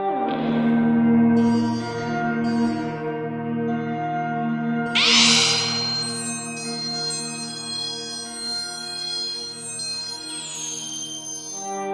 zinging up and down abstract loop, synth and electronic